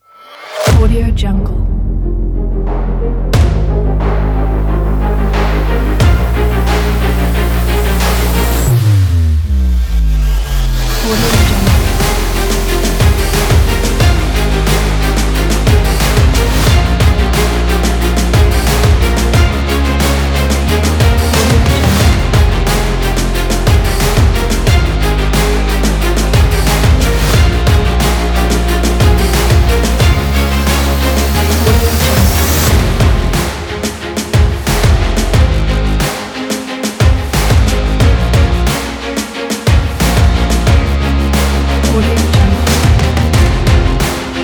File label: sport motivational epic trailer kit